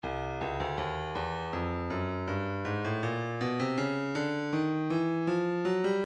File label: breakbeat midi files 080 084 bpm; Stylus; 080 Modren E